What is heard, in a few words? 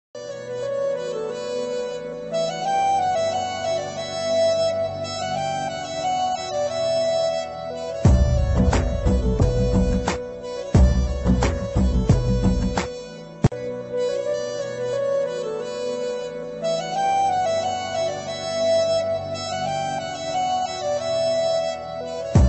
instrumental ringtone, mar mitenge 2, south instrumental ringtone